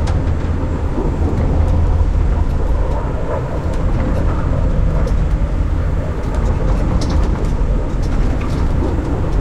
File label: dropship interior; Quake 4 Alpha; id software